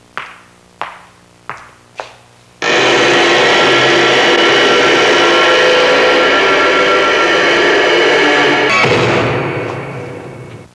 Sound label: opening cell door; Household